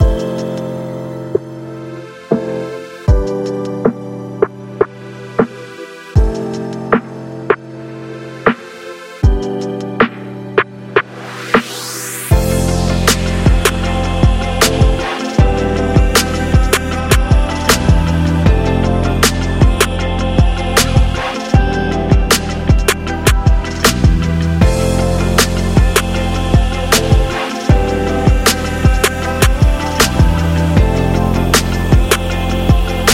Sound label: Luxury Soul